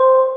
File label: countdown; Tictic